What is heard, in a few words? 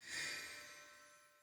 UI Virtue Gem Roll Over; Arsenal